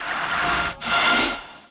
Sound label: D+B; Best Service; XXL Production Effects; DANCE; Sample CDs